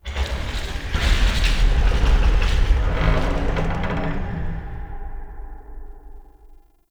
Asteroid Big Ship Groans; Ambience; Warframe; Grineer

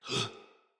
Attack, Battle Barks; Envoy

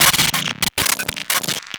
Choke Transference Glitch; Sacrifice Arsenal; Operator; Warframe; Cinematics

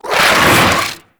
Legs Roll A; Amalgam Moa Satyr; Abilities

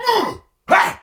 Attack Effort; Warframe; Tau; Dax; Ashigaru

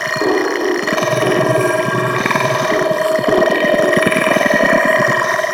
Computer Console; Warframe; Corpus

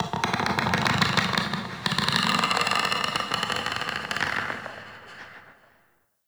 Forest Distant Tree Creak; Warframe; Grineer

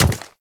Step Default; Warframe; Ballas Chimera

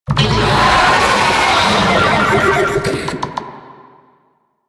Proto Infested Babau Idle, Vocals

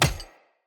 Death Default; Enemy; Sinecure; Melee Grunt; Death Effects

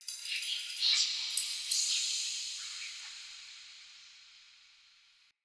Day Texture, Ambience

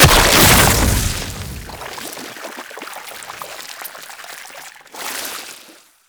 Inked Enemy Death; Warframe; Tenno; Ink Rifle

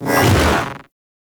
Hoverboard Jump Spin Fast; Vehicles; Warframe; Venus Hoverboard

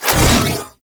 Ability Throw; Warframe; Trapper

